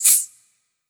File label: Snake